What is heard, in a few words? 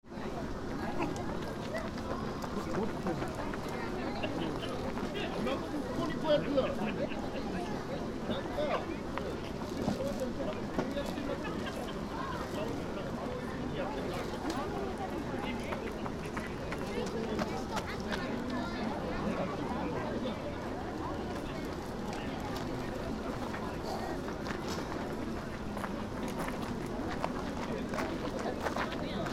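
parking, Parking area in front of Gokoku Shrine; shinobuyama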